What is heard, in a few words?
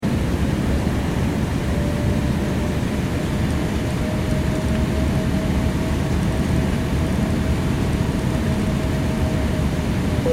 transportation aircraft commercial airbus a340 interior approach, In-Flight Final Approach Ambience; Airplanes; Vehicles